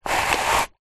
paper shredder manual handle turn single shred paper; office